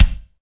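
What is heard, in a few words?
bass drum, drums; Best Service; Real Mega Drums VOL-1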